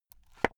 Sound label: watch case small close soft